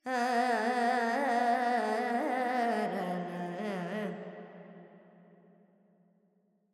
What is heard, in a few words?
Verb Dorian, Vocals, Carnatic Female, Alaps, 70 G